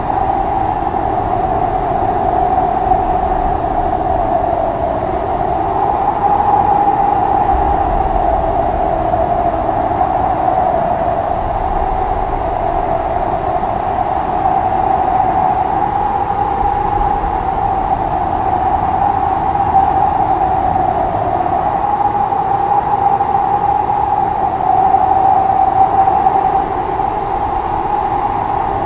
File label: scary wind; ambience; aomdc